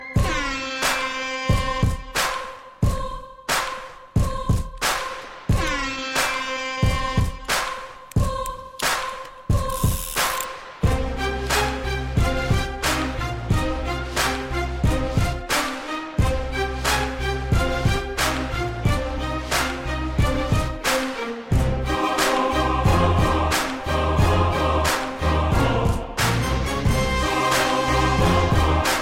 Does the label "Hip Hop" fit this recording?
Yes